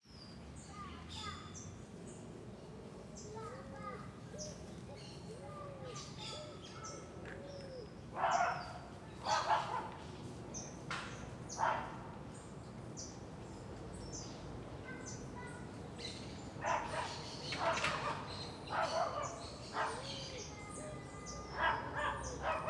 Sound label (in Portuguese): Cachorros Pequenos Latindo e Passando em Quadra Residencial; da Asa Norte; manha